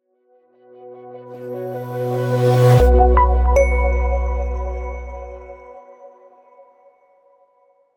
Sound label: short minimal logo